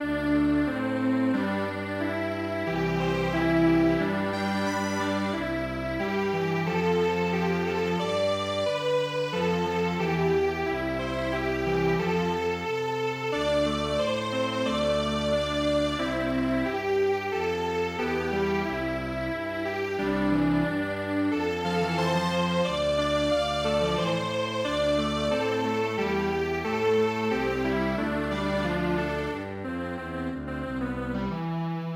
Strings Quartet

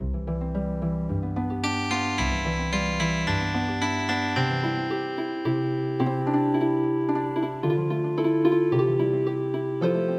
Healing Harp, traditional

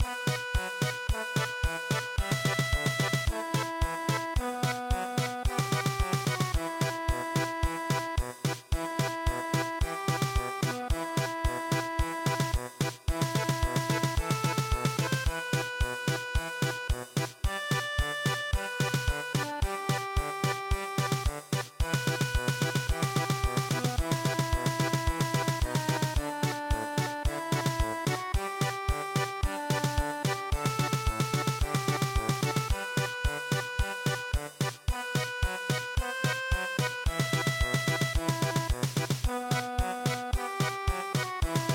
German Polka

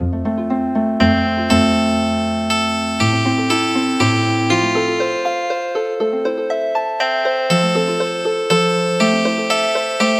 Harp

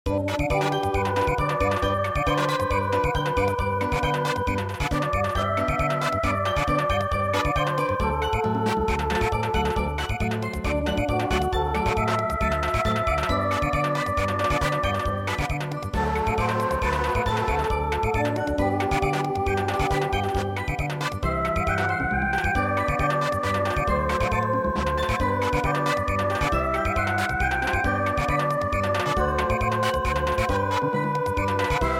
Samba, midi

